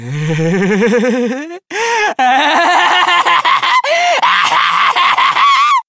crazy laugh

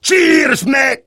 cheers; demoman

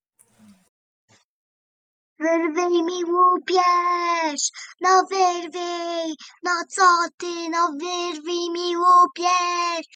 female cover

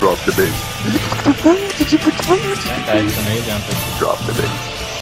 drop the bass

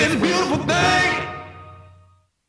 jingle; harveys

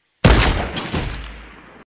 headshot sound; fortnite

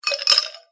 ice break

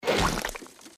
splat

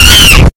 monkey

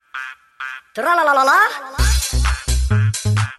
trallalalala

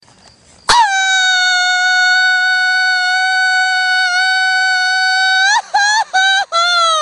mexican grito

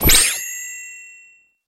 boost gauge max

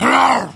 wolf rurrf

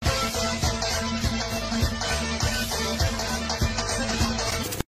lezginka; dyadya shnyuk puddle

